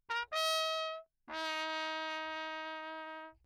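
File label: trumpet mute Lechner signal; CrownAlu; Perinétventiltrompete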